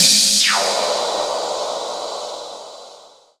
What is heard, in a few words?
D+B